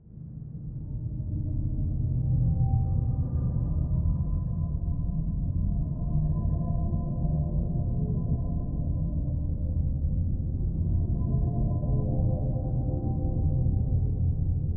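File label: ambient dungeon catacombs generic; skyrim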